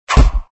swing thud; dangerous dave

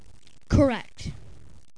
correct

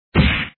hitz

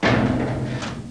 stick; jigsaw

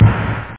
DZING, SNARE; da capo vol1; drums

